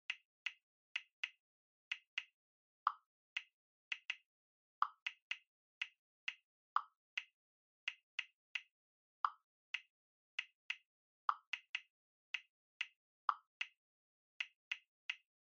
Smartphone Typing Speaker Slow

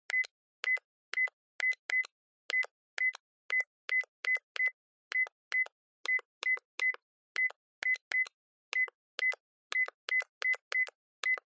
Cell Phone Hardware Button Beep

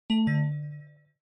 OS Sound Notification

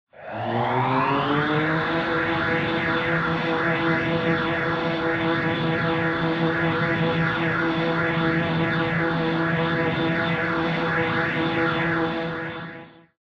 Retro Anime Laser Charge

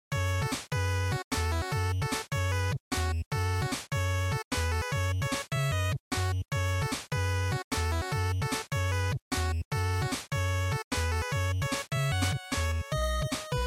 GB Sports Game

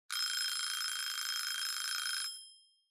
Kitchen Timer Alarm Mid

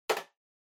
PC Keyboard Far Enter Hard

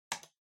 PC Mouse Far L Hard

